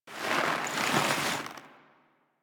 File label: Foley Hands To Back; Parvos; Warframe; Parvos Lich Intro